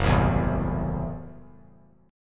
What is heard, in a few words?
Gb Minor 7th Flat 5th Gb, guitar; strummed; 3C,3F,3A,3D; JGuitar